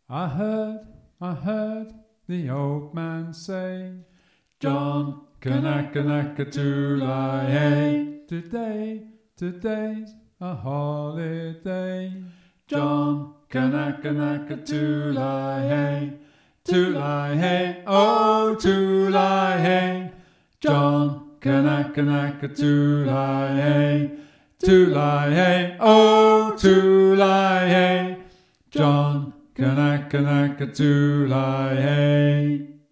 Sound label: alto; John Kanaka